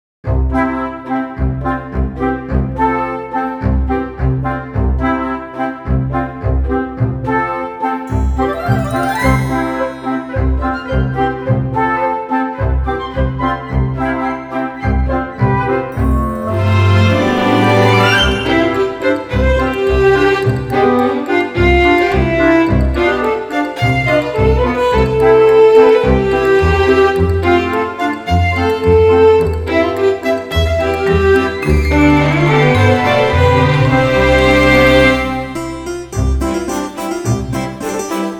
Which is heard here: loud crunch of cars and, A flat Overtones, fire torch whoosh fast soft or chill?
chill